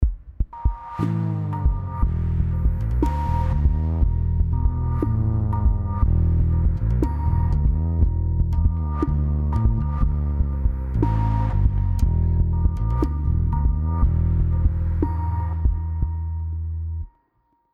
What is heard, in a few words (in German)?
Low Structures MS Kompression; DC Thresh 4